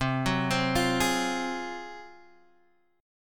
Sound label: G sharp 13th C